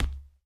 hardwood step; Golden Crayon Sounds

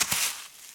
deep sand step; Golden Crayon Sounds

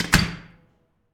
door close; Golden Crayon Sounds

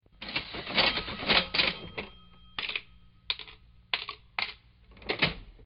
get cash; RS Games Sounds; Monopoly

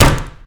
wall wood; Golden Crayon Sounds

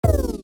unspawn; Golden Crayon Sounds

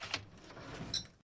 door open; Golden Crayon Sounds

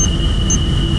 carrier missile loop; Original TK Sounds

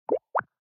Shutter; Skype Sounds

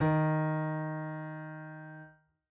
piano d-1#; Golden Crayon Sounds

